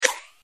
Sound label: checkbox on; Delicious Emilys Childhood Memories